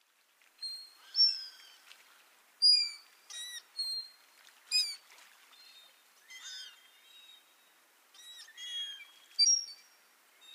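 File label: Common Gull, nemuro; kamome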